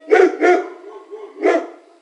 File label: dog bark close; ambient; creatures